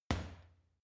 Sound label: boxing punching bag uppercut soft